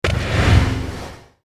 wave and breathy effect; اثر برخورد موج

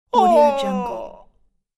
male lost moan; human sounds; ناله مرد گمشده

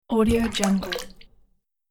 water; nature sounds

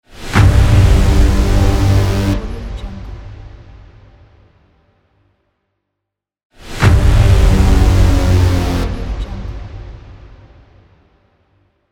braam hit; transitions and movement

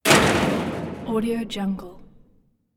metal door closing; domestic sounds

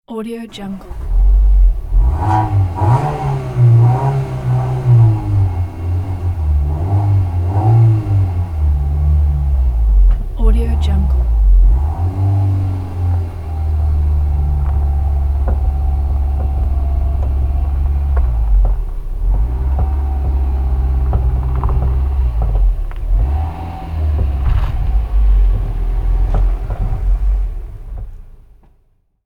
car revving and driving back on gravel rear sound

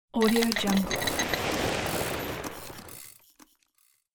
game spinning mechanism release; چرخیدن چرخ دنده ها

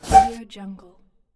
whooshing squeak; transitions and movement

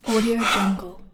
male breathing individual heavy breathes big; human sounds

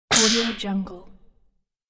game gurgle paralyze

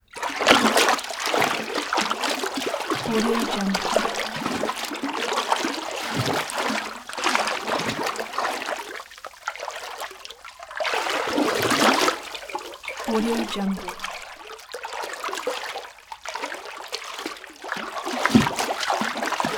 sports swimming water swim wade moves various; human sounds; حرکت دست شناگر هنگام ش

